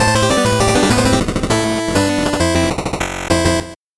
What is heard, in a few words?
8bit jingle fast